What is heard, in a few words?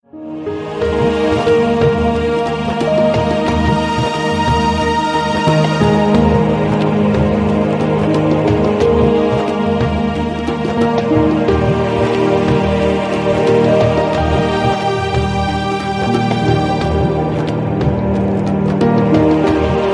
Romantic